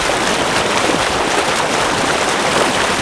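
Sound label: waterfall; Colin McRae Rally 3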